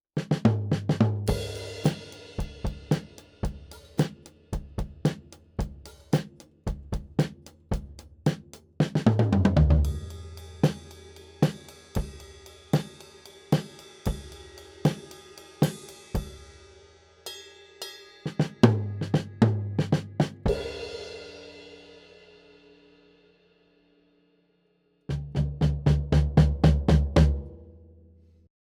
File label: Coles 4038 Mono Overhead Drums